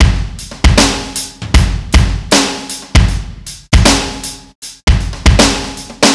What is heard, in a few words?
Funk Foot, Drums Beats